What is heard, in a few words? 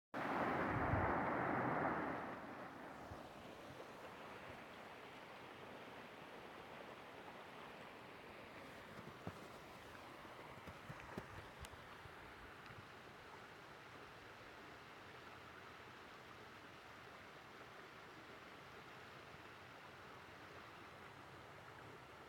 falls sledding hill; berea